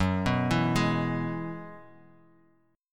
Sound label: F sharp 7th Suspended 2nd Suspended 4th, Guitar, Half Step Down